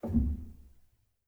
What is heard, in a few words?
rel, Grand Piano; bitKlavier Grand; Piano Bar